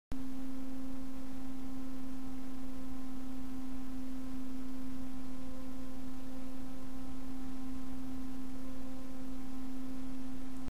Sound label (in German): Noctua NH-L9i ohne Kuehlkoerper 7V